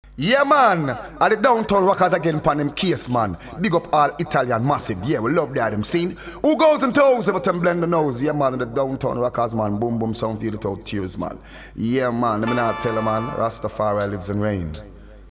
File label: Jingle